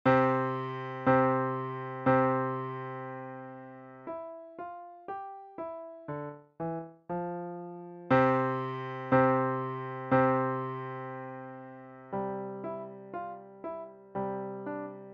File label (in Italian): Forte und Piano